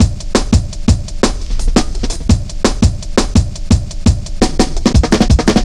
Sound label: 170, Drum Loops; 90 s Sample CDs; Total Drum&Bass